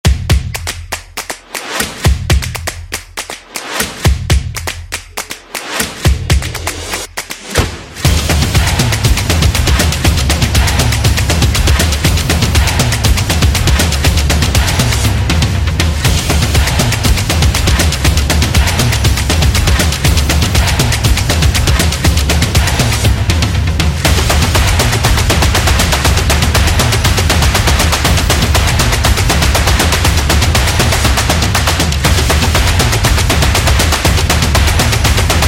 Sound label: Stomp